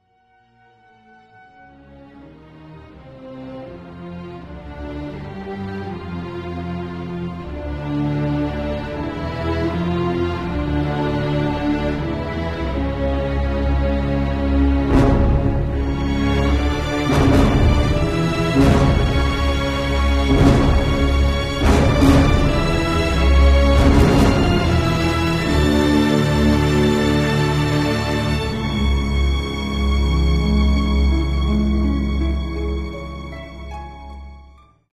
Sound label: failure, music; mohaa